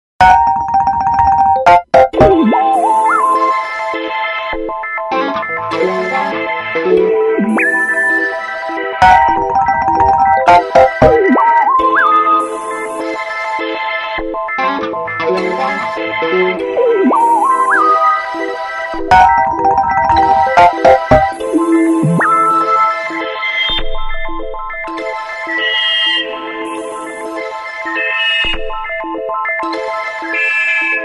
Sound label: theremin